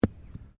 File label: Golf ball impact grass; Various Golf sounds; Sports